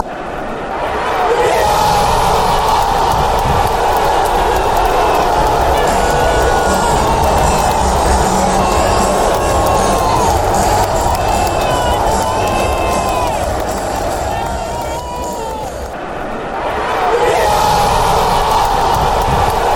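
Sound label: celtic score, football songs and soccer chants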